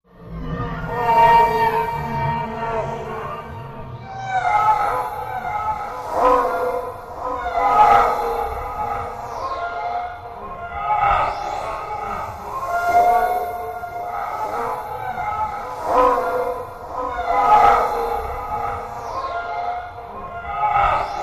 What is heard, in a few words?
Over Voice Alien Cry Echoes Ambience